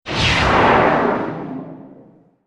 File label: block